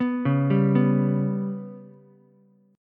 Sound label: B Suspended 2nd Sharp 5th down Ukulele; arpeggiated; JGuitar